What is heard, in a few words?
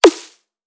Preshifted Snare